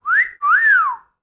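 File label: cartoon whistle sounds at the sight of a beauty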